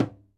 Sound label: Tom, Drums; PX7; Digital Sound Factory Protean Drums